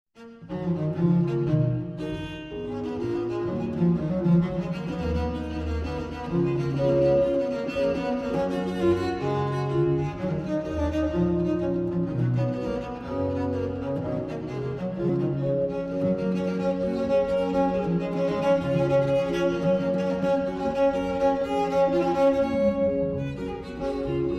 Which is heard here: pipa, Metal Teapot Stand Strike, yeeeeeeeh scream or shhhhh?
pipa